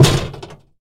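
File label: light hit on bucket lid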